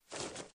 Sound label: Pick Up Teddy; Granny 3; Android